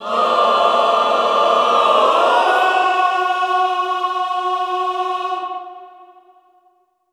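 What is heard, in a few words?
Cluster Unison F#3, Classical Choir; Best Service; Extended Classical Choir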